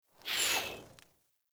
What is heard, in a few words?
Weapon Liberty Launcher Tail; Warframe; Caliber Chicks Minigame; Weapons